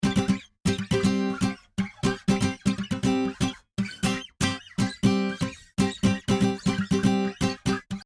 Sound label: guitar